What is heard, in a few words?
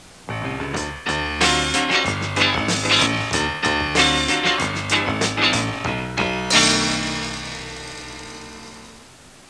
disco